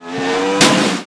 ATTACK

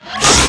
attack

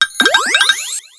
magician gnome

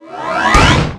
attack

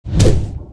ATTACK ENGINEER

